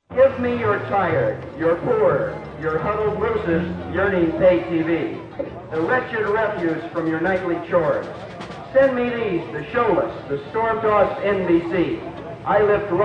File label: March & Fanfare